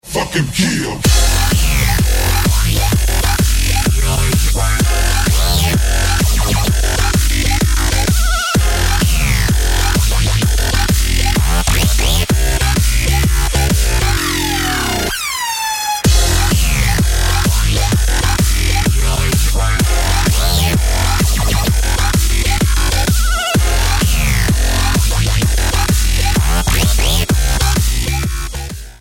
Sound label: Electro Station